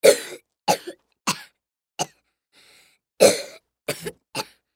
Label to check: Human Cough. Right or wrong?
Right